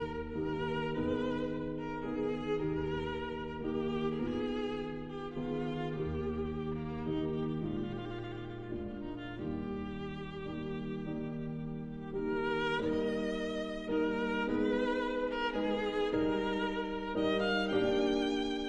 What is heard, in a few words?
Sicilienne for violin and piano